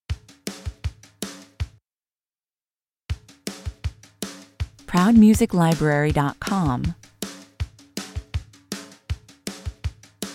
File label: drums only